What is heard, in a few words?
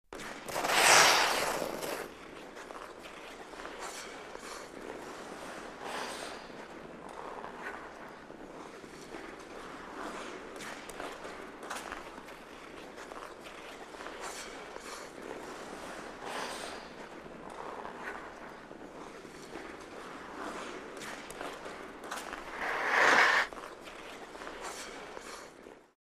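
Hockey Players Skate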